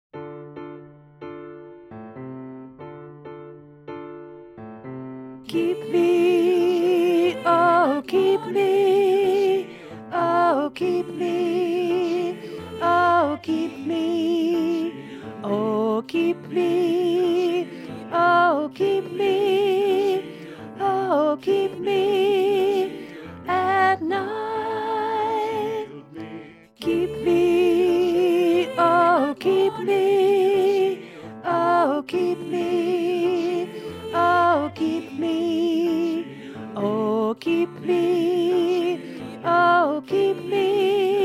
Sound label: alto learning track